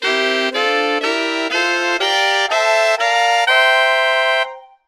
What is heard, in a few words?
Block Chords Saxes